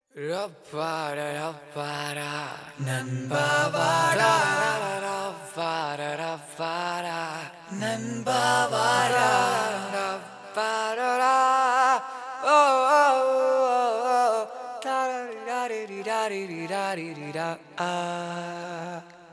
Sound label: Humming Bgm; Pallikoodam